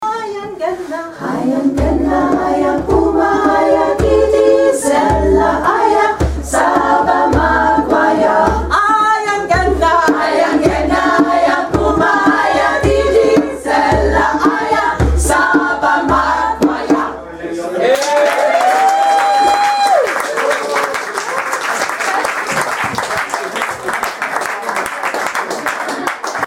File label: Zulu song